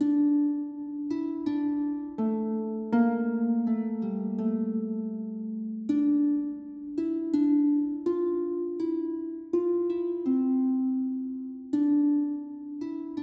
needolin alt melodies deep

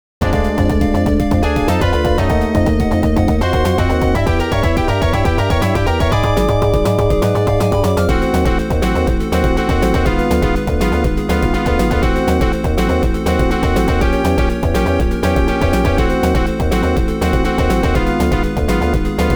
Boss Battle; Mega Man The Wily Wars; The 16bit Audiophile Project; Genesis

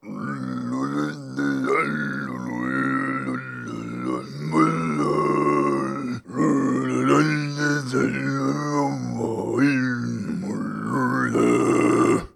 sing

